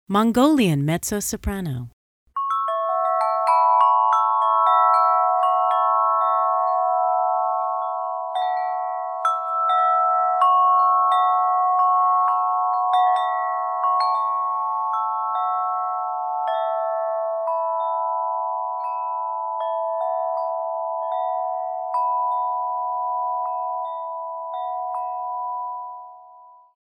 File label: Mongolian Mezzo Soprano